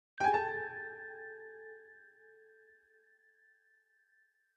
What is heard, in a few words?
Ending Arcade three notes